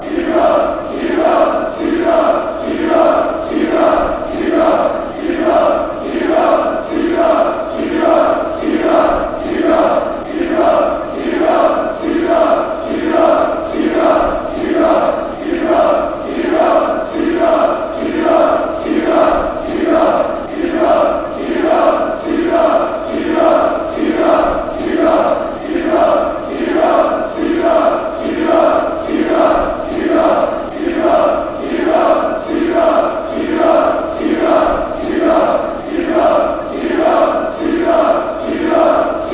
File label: fanchants